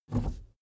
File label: suv pull back gear across